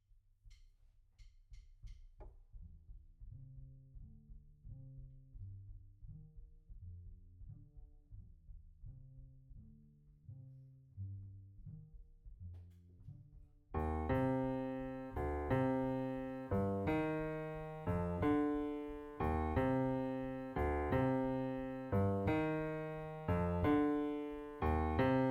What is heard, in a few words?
Piano B